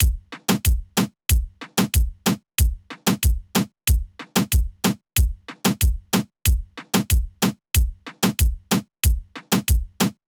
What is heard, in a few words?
Compressed Drum Loop